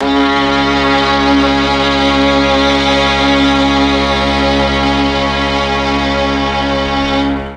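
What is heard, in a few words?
C#2 mixed strings, Mellotron; Mike Pinder - Mellotron Archive CD; MIXED STRGS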